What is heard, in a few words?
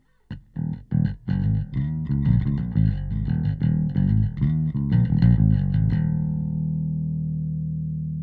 Limit bass bypass; SSL X